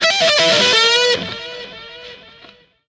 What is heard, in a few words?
Guitar